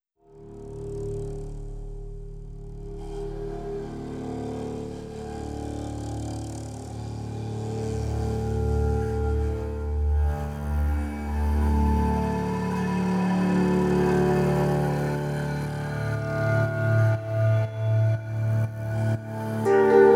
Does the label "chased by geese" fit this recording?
No